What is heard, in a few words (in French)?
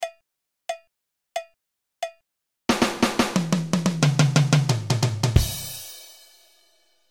roulement 4 temps faux